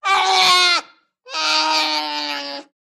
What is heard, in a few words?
Bear, Unknown Type Cries. Single Cub Cries. Close Perspective.